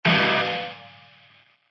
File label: Distro Snare, DrumKit; REAL LIVE KITS; Osama Qureshi DrumKit